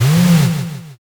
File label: servo